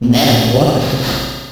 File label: metamorphosis echo